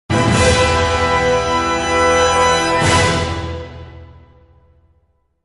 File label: battle suspense; Knights of the Old Republic II-The Sith Lords